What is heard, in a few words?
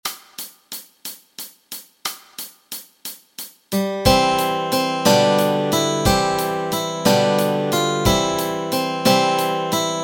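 melody and chords, Easy Guitar and Ukulele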